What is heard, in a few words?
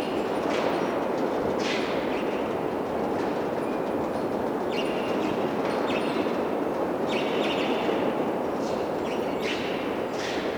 Building Blocks rooftops; Buildings; Megacity